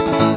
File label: flamenco strum, Guitars